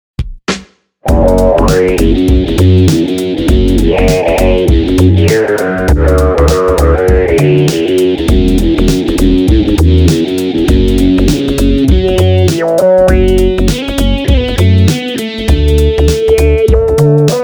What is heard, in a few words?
patch demo